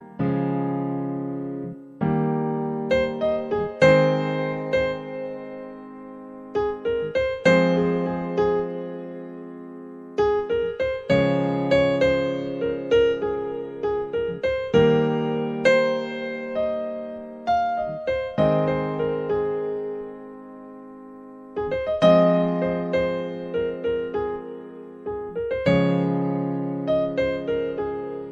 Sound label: piano; perfect piano hollywood